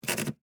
Typewriter Scroll, Technology, Typewriter, Smith, Corona, Scroll, Paper; Typing; BlastWave